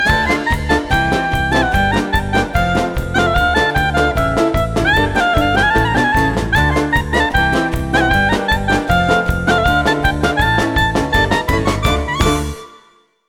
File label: ringtone